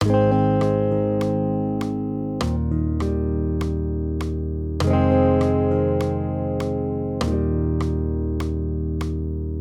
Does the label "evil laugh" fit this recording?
No